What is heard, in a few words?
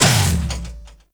Mech Run Stop; Nechro